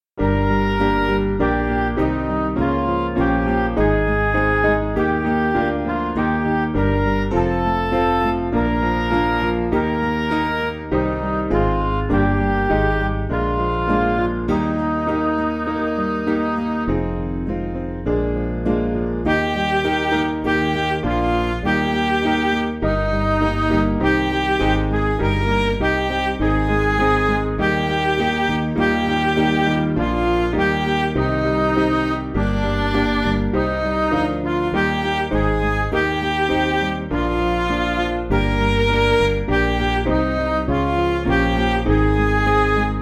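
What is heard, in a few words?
Music Backing Files for Churches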